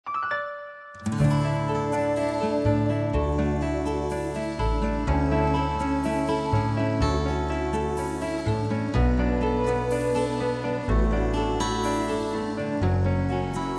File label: atmosphere